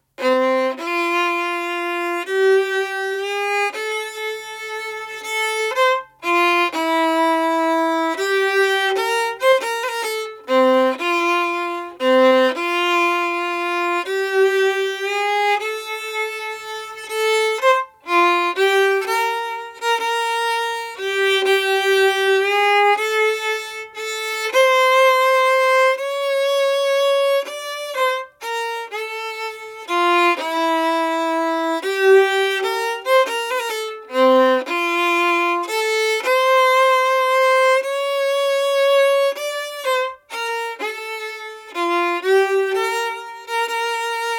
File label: waltz quadrille harmony, fiddle